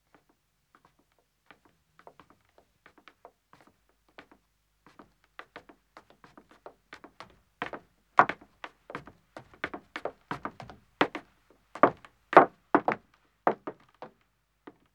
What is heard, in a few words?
Wooden Stairs Ascending, 1 Man, footsteps; BBC Sound Effects Library